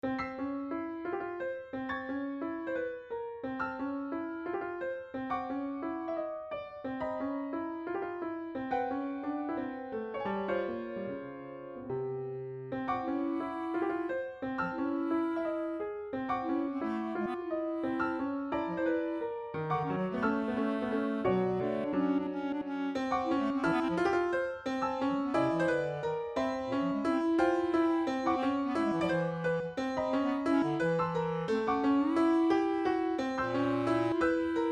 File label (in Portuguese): swing andando